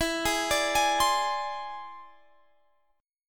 E Augmented 7th E down Guitar Standard; E+7 arpeggiated; JGuitar

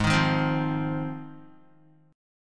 G sharp 6th G sharp down Guitar, chord

